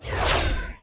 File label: Missile Hit